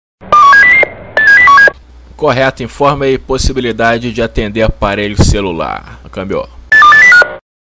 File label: Police Brazil; Pm Police Brazil